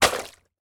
leisure toy car plastic med large drop into deep water splash